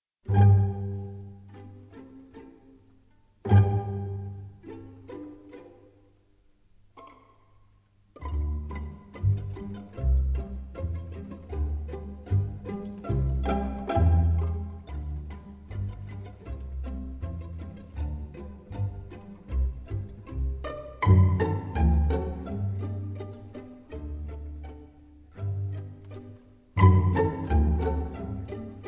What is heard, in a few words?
Polka Pizzicato; Strauss